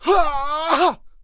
Hoplite Death Vocals

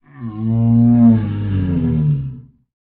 Ice Giant Death Vocals

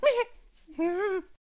Poacher Attack Vocals

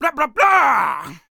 Knight Attack Vocals

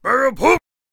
King Attack Vocals

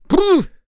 Attack Vocals

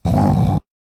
Minotaur Attack Vocals

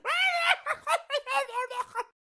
Mad Mechanic Attack Vocals

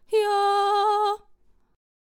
Celestial Aegis Attack Vocals

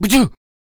Stoner Attack Vocals

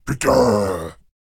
Attack Vocals

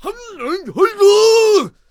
Hwacha Attack Vocals

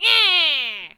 Pirate Queen Attack Vocals

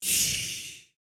Skeleton Archer Attack Vocals

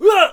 Attack Vocals

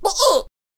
Banner Bearer Death Vocals

